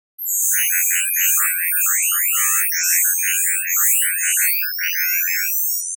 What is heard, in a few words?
Spectro Graph, spacey